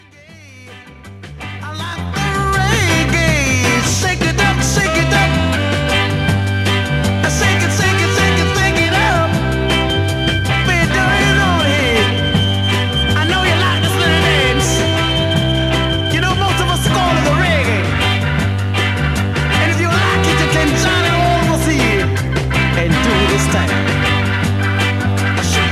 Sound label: Reggae